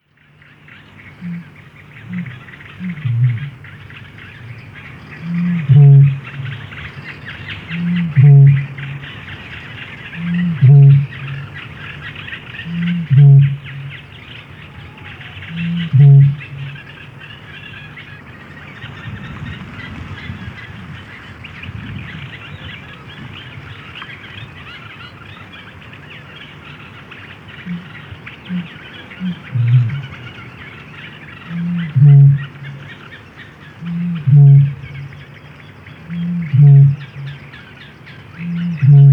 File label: Bittern, birdsong